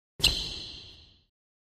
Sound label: Racquetball; Shoe Squeaking On Court